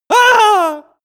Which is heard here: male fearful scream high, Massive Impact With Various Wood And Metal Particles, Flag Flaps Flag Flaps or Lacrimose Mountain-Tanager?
male fearful scream high